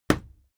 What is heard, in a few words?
fight impact sweetener cloth; krieg-waffen; nahkampf; soundpool